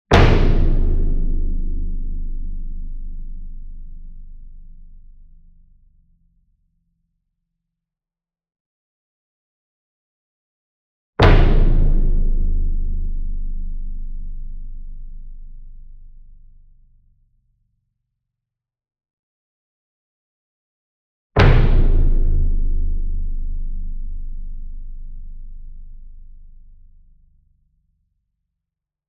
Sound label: SINGLE DRUM Bass Drum Concert 40 Inch Flam Soft Beater Medium; boom library; Cinematic Strikes Construction Kit